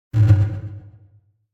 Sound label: Impact Muted Dull Hit Fast Pulsating - Fast Hit; Hollywood Edge; sound designer's toolkit v2